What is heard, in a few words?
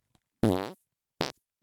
fart; vegan